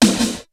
REVERSE DRUM

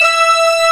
MALE CHOIR

